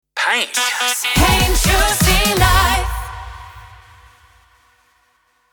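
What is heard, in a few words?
Sung Jingle, Radio, 124bpm Ab minor, Sting; Paint Juicy Radio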